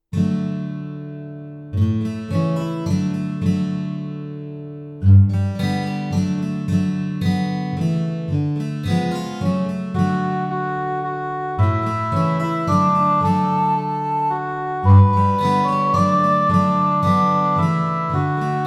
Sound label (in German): Ave Maria zart git D